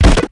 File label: Sfx Americanfootball